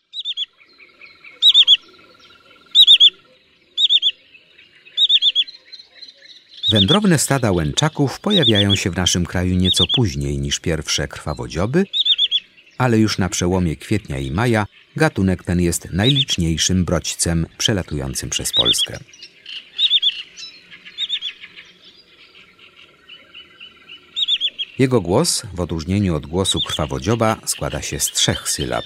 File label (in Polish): Łęczak, Ptaki; Kurs PTTK